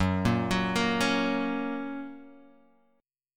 F sharp Major, Guitar, Half Step Down

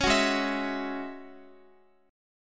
Ab 7th Suspended 4th Sharp 5th Ab down Guitar

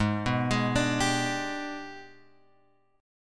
G sharp Minor Major 7th Flat 5th, chord; G#mM7b5 Chord {4 2 X 1 3 3} arpeggiated

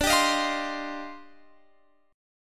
Eb Major 13th D, guitar

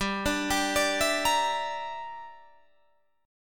G Minor 6th, Guitar Open D

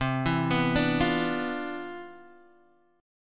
C Major 11th, Guitar Open D, chord

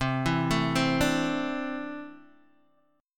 C 6th Add 9th C down Guitar DADGAD

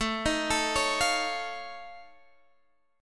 F Augmented 7th A, chord

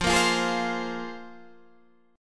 Bb 7th Suspended 2nd F

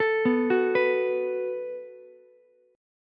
F sharp Minor Double Flat 5th F sharp, Ukulele; arpeggiated; JGuitar

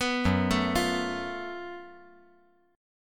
Gb Minor Major 7th Double Flat 5th, chord

